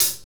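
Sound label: hi-hat, Techno Rock; Northstar; Drumscapes Roland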